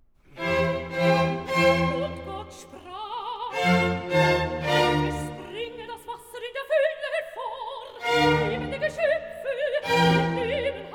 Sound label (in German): Rezitativ; Und Gott sprach: Es bringe das Wasser; Haydn; Die Schöpfung